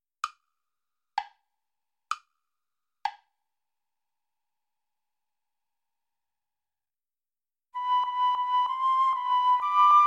tango play along without guitar; alba niz isaac

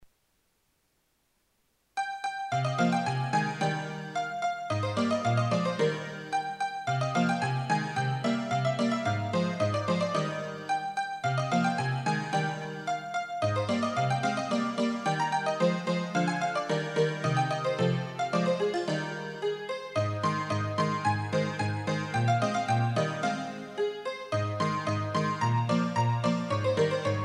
piano

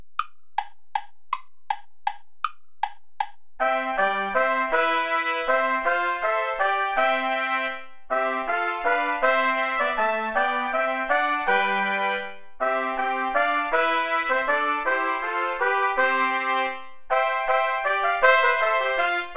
gelobt sei gott play along without trumpet; vulpius melchior

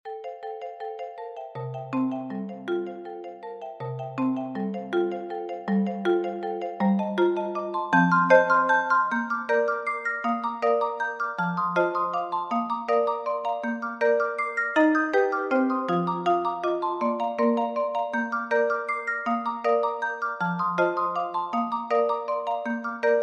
for marimba duet; burgma ller johann friedrich franz; the light hearted maiden from tudes

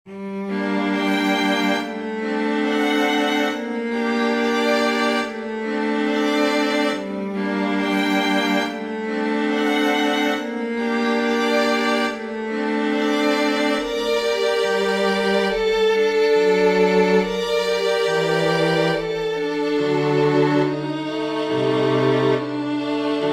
chorale for string quartet; bach johann sebastian; selig sind die aus erbarmen

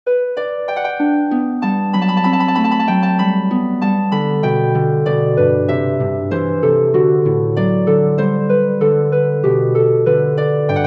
bourree from the french suite g major for harp; bach johann sebastian

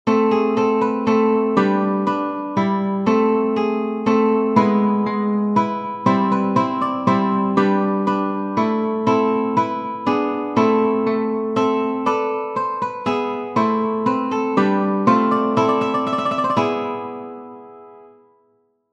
minuet; purcell henry

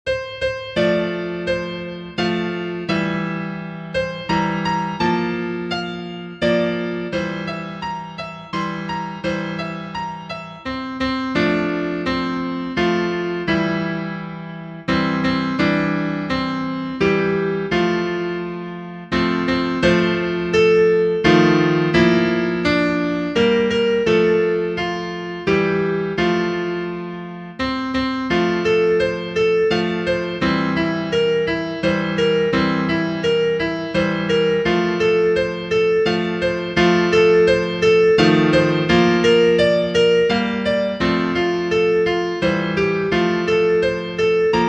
happy birthday karaoke; hill patty

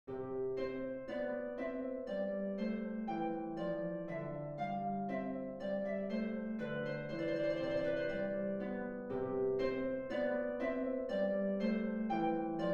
passepied

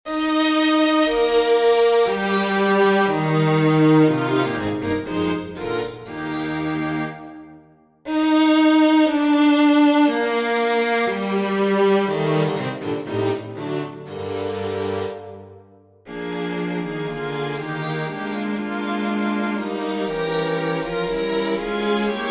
national song, for string quartet; grieg edvard; from lyric pieces

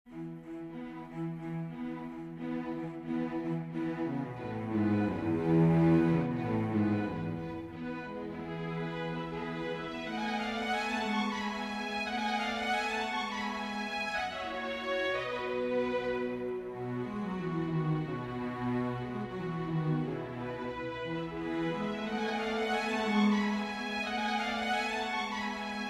mazurka major for string quartet; chopin

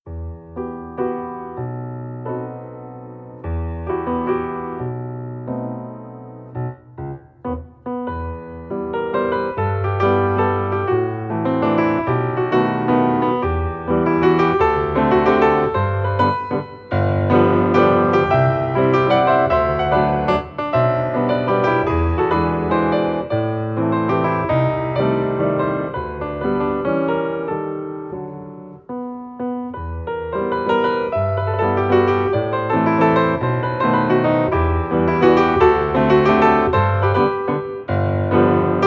old russian waltz for piano hands

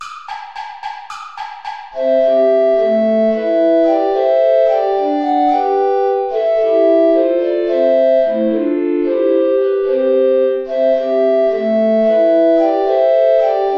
play along without soprano recorder

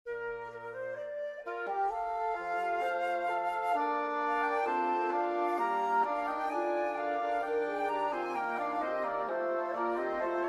for wind quintet; Charpentier Marc-Antoine; san achish

